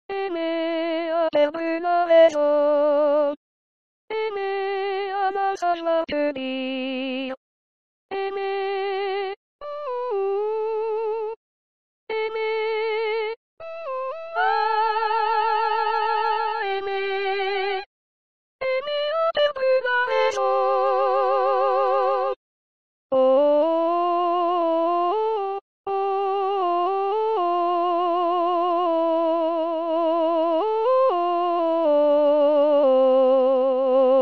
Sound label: soprano